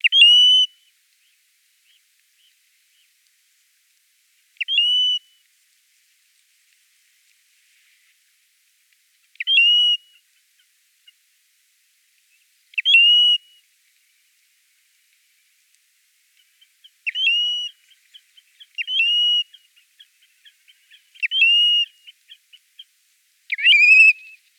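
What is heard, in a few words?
American golden plover voice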